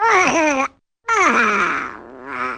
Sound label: duck yawning